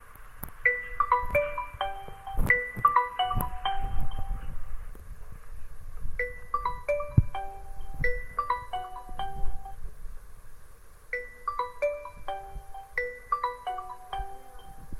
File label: mobile phone tone ringtone